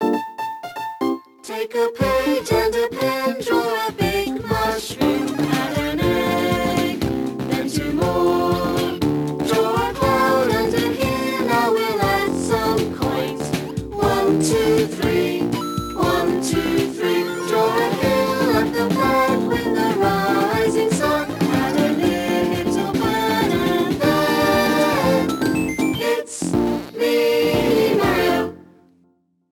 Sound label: Drawing Song; Flipnote Studio; Mario